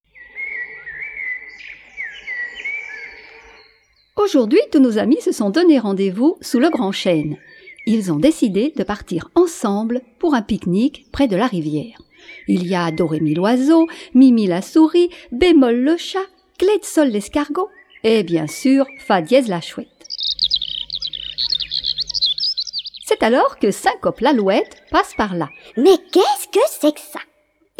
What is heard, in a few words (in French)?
l'alouette chante très haut